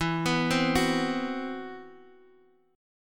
C Major Sharp 11th E

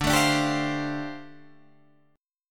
G 7th Suspended 2nd Suspended 4th D

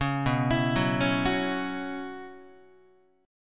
B Suspended 2nd Suspended 4th B Guitar, chord; Bsus2sus4 arpeggiated; 4,7,7,4,5,5; 4A,4E,5A,5D,5G#,6C#; JGuitar

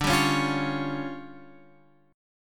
D Minor Major 7th Double Flat 5th D, guitar

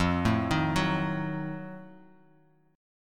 F 7th Flat 9th F down; F7b9 arpeggiated

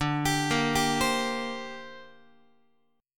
D 7th Suspended 4th Sharp 5th D Guitar; arpeggiated; JGuitar

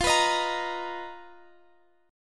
B Major 7th F; BM7/F; strummed

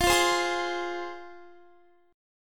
F Minor, down, Guitar; strummed; tuning 4F,4A,5C,5G,5C,6E

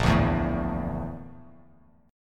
Bb Major 7th Flat 5th Bb Bass Standard; BbM7b5 strummed; JGuitar

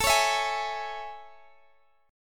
A Major 7th A, Guitar, Drop D

